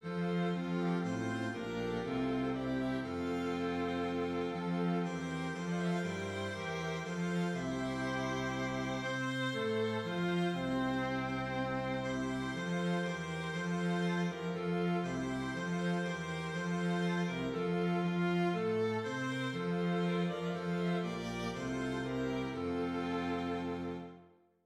Strings

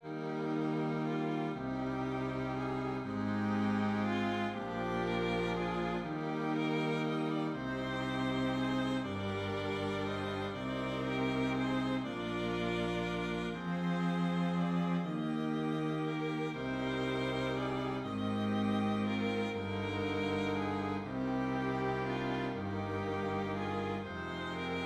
Hymn Strings

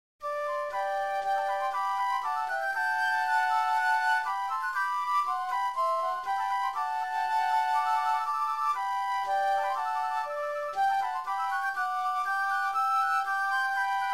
Flute

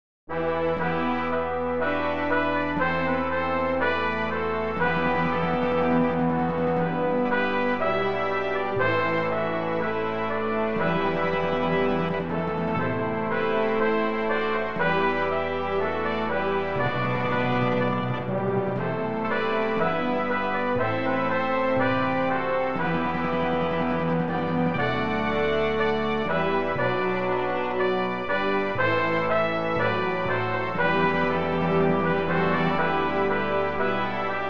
Hymn Brass

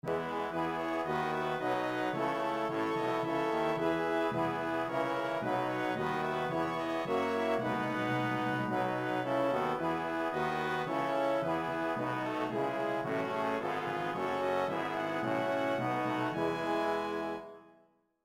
Brass; Hymn

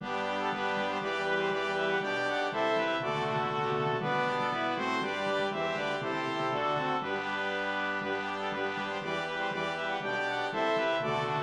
Hymn BRASS

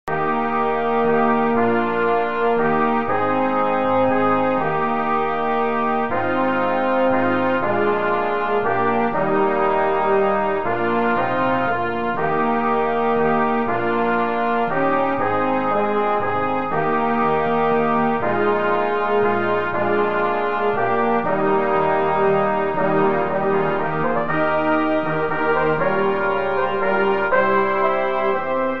Brass

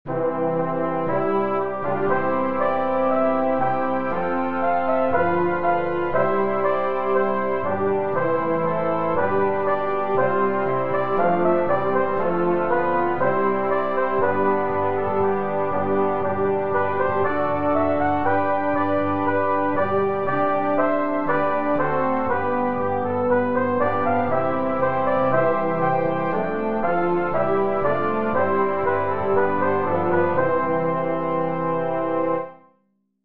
Hymn

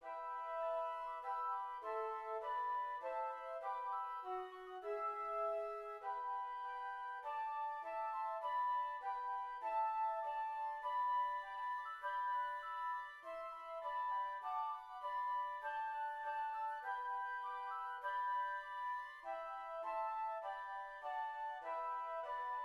Flute; Hymn; When Christ’s Appearance Was Made Known, or Erhalt uns, Herr

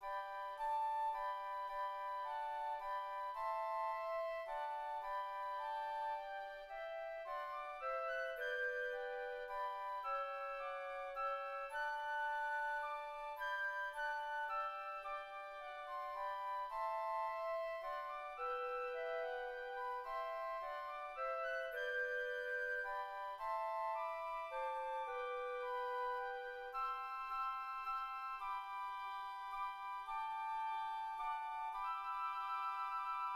Hymn FLUTE

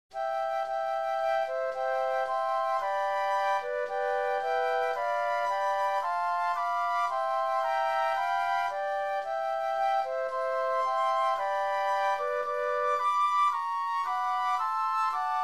Hymn Flute

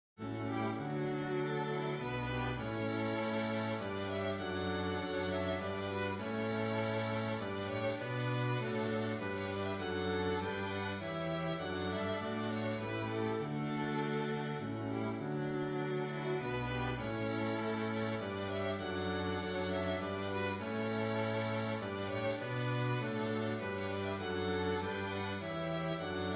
Hymn Strings

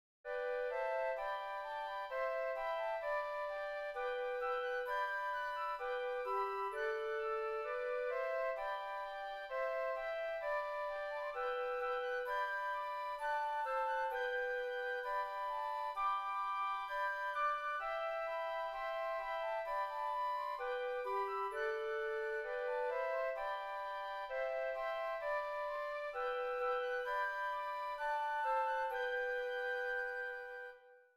How Firm Flutes

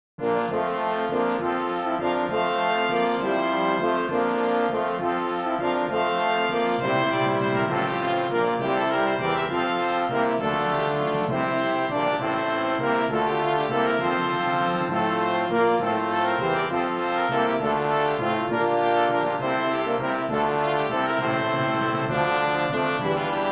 Hymn BRASS

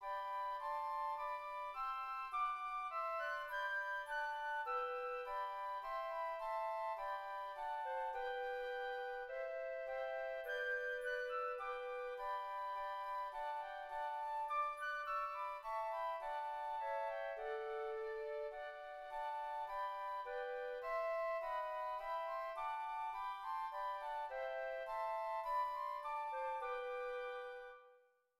Flutes

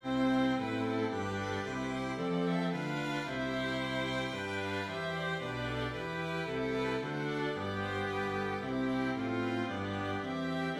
Strings

